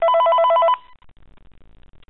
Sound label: phone call sound; fnaf 3